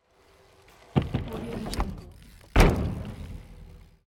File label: run onto a kicker ramp and bicycle turnaround; urban sounds